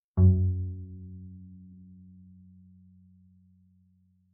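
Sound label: Bass pizzicato fortissimo sul D Gb2, Double Bass; MIS Pitches - 2014; Strings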